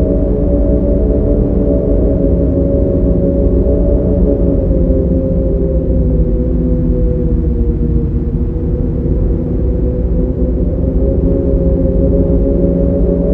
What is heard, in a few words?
Cave Opening Loop; tldn; Slave